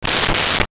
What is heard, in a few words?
hair spray; Bathroom